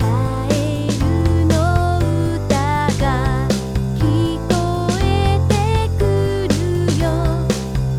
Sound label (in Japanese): Vocal MIXなし; synthv pro2 vocal mix